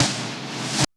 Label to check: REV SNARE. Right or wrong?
Right